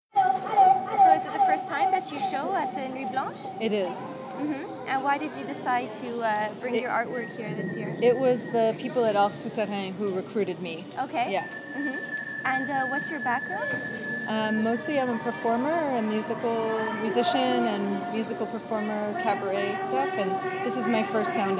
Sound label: Vocal Box